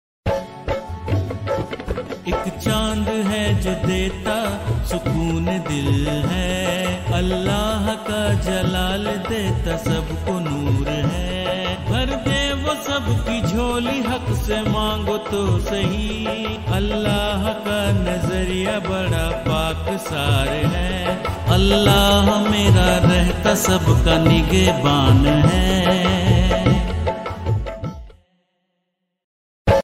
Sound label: islamic ringtone